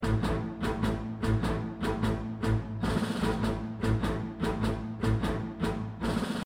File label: Menu Load, Music; Dungeon Defender; DunDef